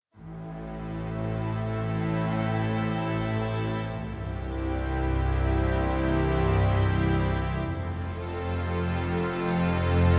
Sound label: Strings and Bass Only